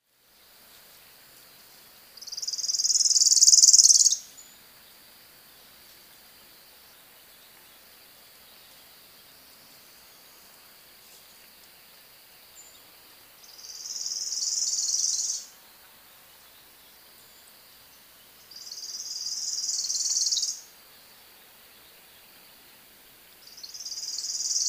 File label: Worm eating Warbler, Oak Hammock; FL; MINWR